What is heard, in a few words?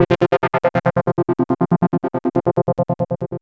Synth, Loops; Trip-Hop